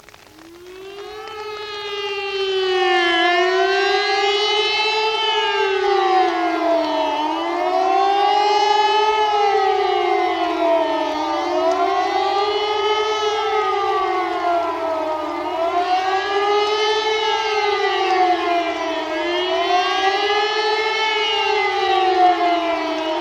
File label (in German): Signalton Sirene Warnung; Sirene C